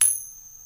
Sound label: coin flip